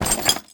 build, ships; ratika

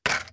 hook, fishing

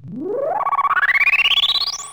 holovid on; ships; combat; CosmicRage

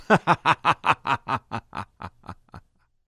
male laugh

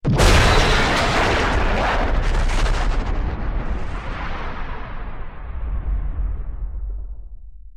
other destroyed, ships combat; CosmicRage; CosmicRageSounds

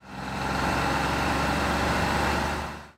overtake, highway; tovertake1

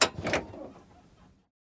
handbrake down, cars

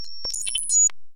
display, ships computer

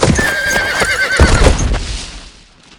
rear, horses; Cosmic Rage

